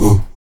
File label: VOX AHOO, Afro Chants, Stacc Ah Oos; Roland LCDP11 Africa VOL-1